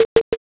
knock

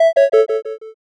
picosad

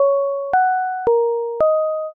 chime broadcast

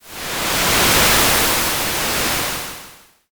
wave

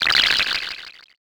text anim

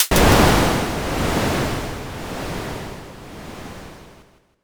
thunder

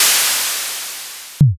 airlock

